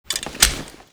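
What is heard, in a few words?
weapon foley at4 drop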